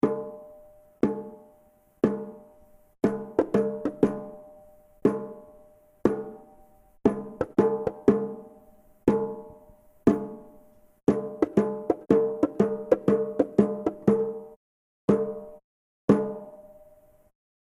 Bongos

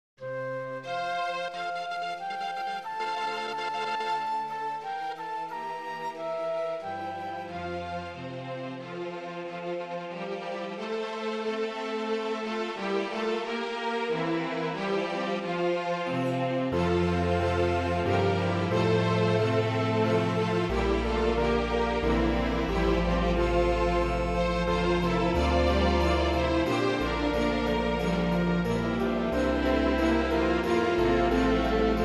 Medley

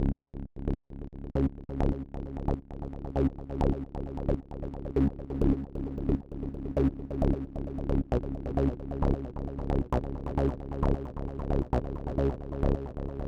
confused bass

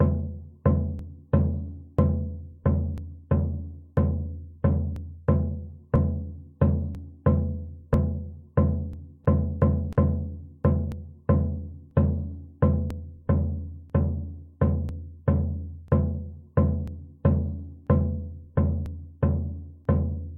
bass drum

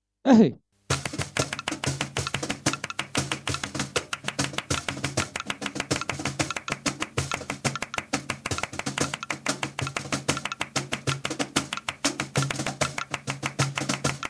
rumba

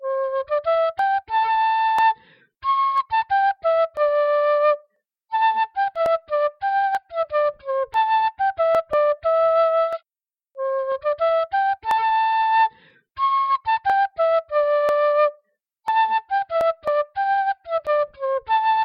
quena

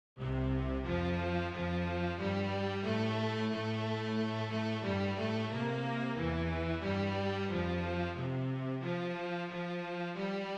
Cello part

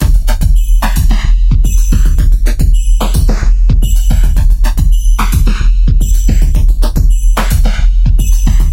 bassline drumloop; bassline project

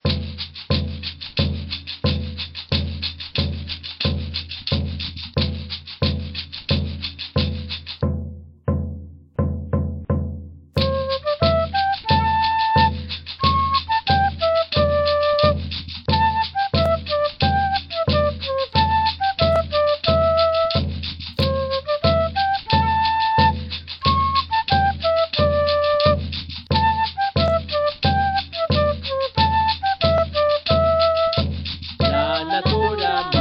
Andean music

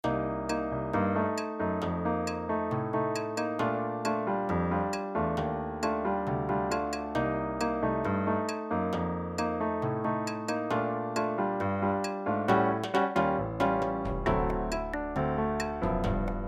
nova bossa

